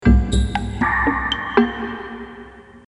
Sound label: login